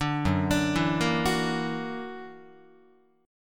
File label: F sharp Augmented 7th, down, Guitar; F#+7 arpeggiated